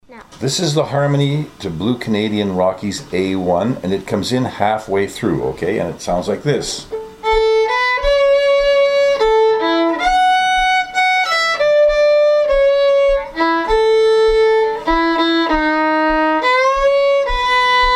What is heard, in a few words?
violin